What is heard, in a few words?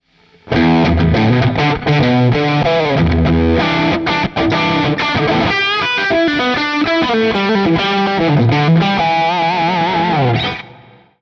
jcm800 ir mesaboogie; third party ir